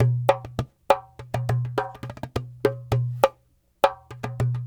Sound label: Dumbek